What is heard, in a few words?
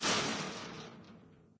chainlink, footsteps, walk; player; source engine; left 4 dead 2; boomer